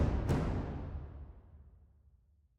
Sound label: Drums Tail 160bpm